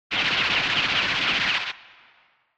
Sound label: Retro Anime Gun Short